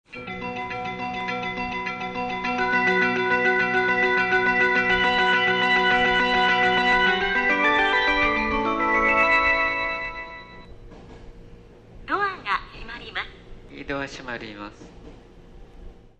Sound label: ofuna melody; jreast; yokosuka